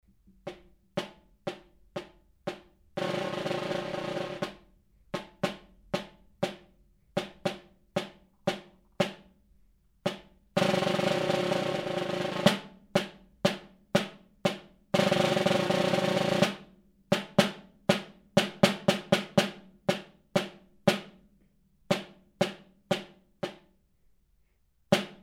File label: snare